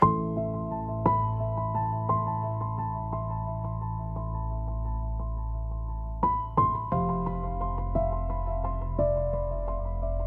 cold case pulse a deep investigative mood; crime-scene

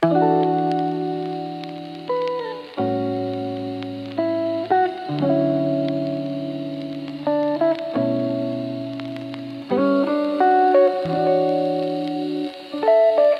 cozy lo fi mood soundtrack

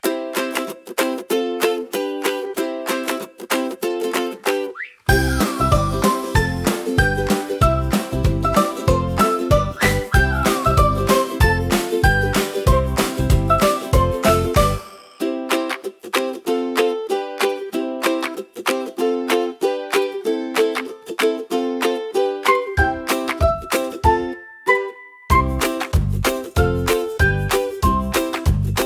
smile parade a joyful kids groove; kids-and-fun

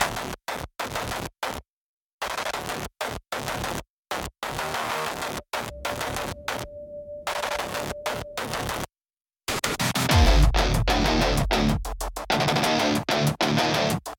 a hybrid action theme; neon clash engine; cyberpunk; Cyber Slam Anthem

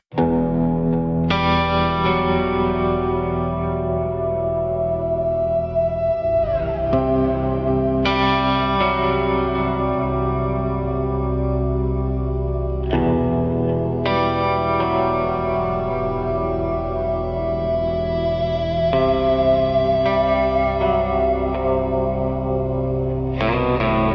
a western mystery ambience; whispering spurs; dark-country

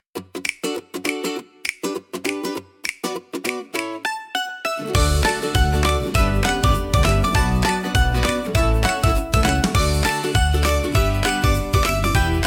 smiling days a feel good pop; pop-and-happy

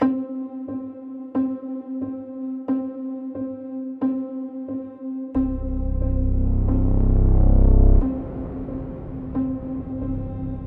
following the clues a dark detective ambience; crime-scene

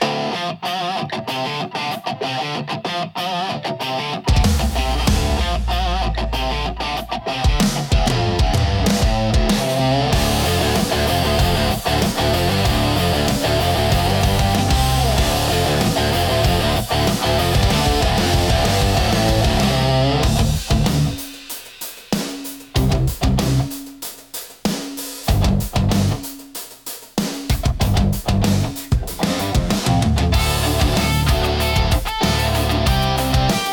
a bold rock motion; thunderwalk

